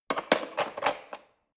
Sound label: cutlery fork drawer handle scrape